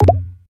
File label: section fail; WhiteCat 3.0